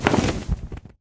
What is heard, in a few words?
wings, enderdragon; mob; Minecraft